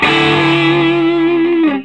SOLO E, Vintage Blues Guitar

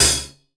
POWR HH, POWER DRUM; AKAI S6000 CD-ROM - Volume 1; DRUM RYTHM

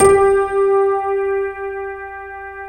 PNO HEAVN; EDENPNO

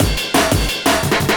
175, Breaks; Drum&Bass; EZ Rollers

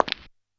C#2 Footsteps, Yamaha PSR-E323 Sound Effect Kit

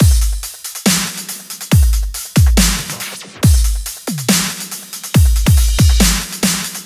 drum Loop 140BPM Full

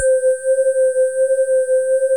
C3, high bell

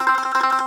BAGLAMA T0YL; E-MU Producer Series Vol. 7 – Old World Instruments

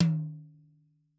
Toms, Yamaha RY20

